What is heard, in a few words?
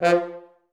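French horn staccato F1, Advanced Orchestra; Best Service; Peter Siedlaczek's Advanced Orchestra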